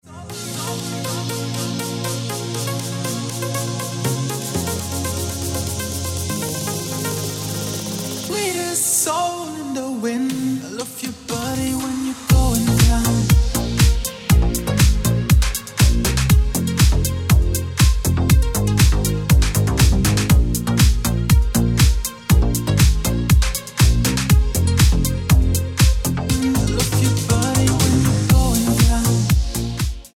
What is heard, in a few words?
Remix Ringtone